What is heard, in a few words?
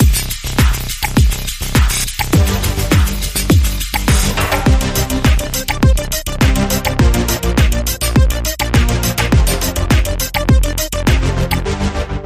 Seven Synths